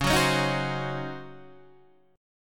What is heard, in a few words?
Db Major 7th Suspended 2nd Suspended 4th Db Guitar Open D